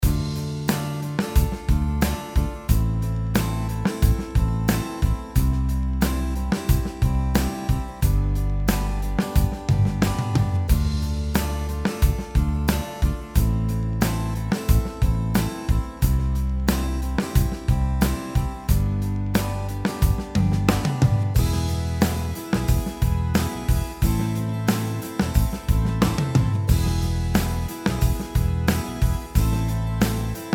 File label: Acoustic Rock Drama